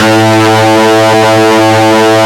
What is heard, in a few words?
HEAVY A1, LEAD SYNTH; Best Service Alpha Dance vol.2